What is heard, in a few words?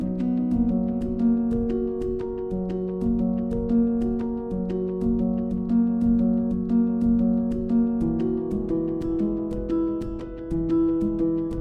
Space 2 piano